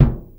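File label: JAZZ KIT bass drum, Drums Acoustic; AKAI; 90s sample CD; USB Soundscan vol.10; JAZZKIT 3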